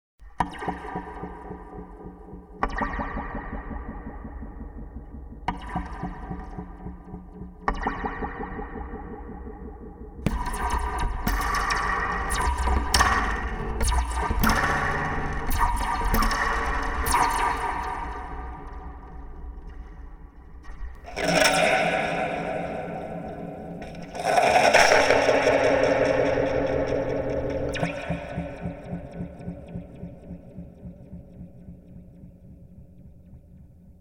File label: Long Spring Thing